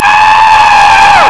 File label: Screeem!; Haunted Mansion